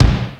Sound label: KIK, Beatmachine; Hollywood Edge; The Big Bad Beatmachine; XE-8